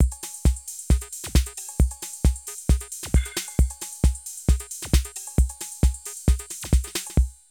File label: Drum loops, Euro techno; Zero-G Groove Construction (1993)